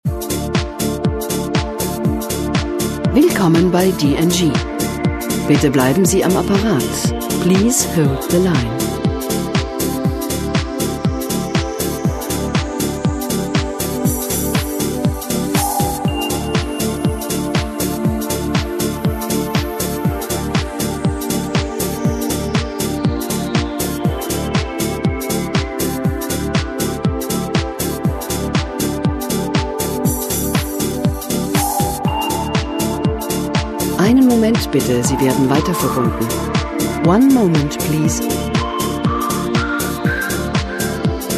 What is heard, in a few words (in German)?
Wartemusik; DNG